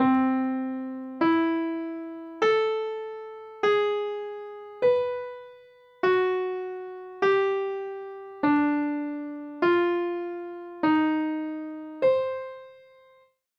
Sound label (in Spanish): melodia; ordena las notas